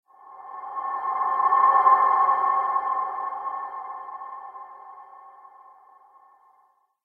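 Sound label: short ambience; crysis warhead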